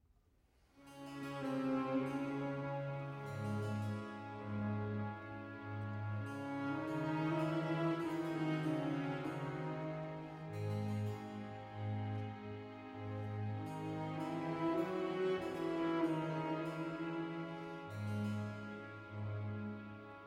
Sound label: Violin Concerto; Violin Concerto No. 2 in E Major, BWV 1042; J.S. Bach